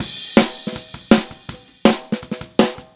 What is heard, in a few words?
Snare, Drums; Drums from the Big Room The Mixes; Take Your Toms Off; Chorus 02